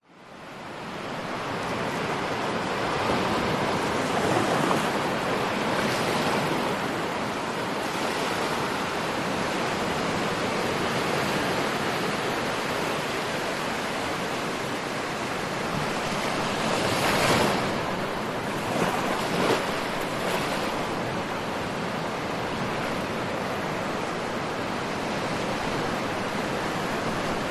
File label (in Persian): sedaye darya